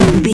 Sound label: retro impact hit